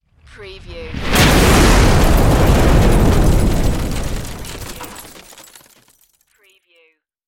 EXPLOSION BLAST MILITARY INCOMING SHELL BUILDING HIT